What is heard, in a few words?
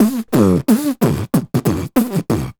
Beat Box, Hip-Hop and RnB Vocals